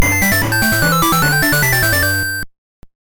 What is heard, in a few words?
Recieved Item!; bomberman quest; gb